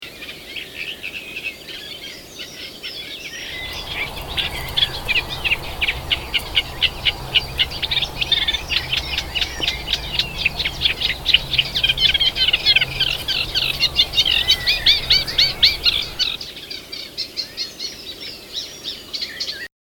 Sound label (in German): Teichrohrsaenger